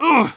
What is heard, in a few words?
pain sharp; Medic